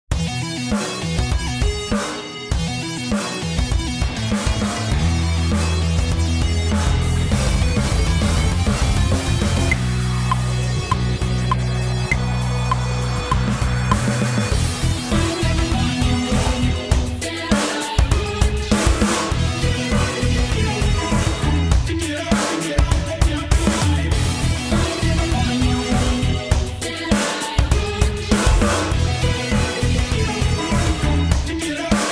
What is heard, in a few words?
Battle Synthrock; Digicalized; Android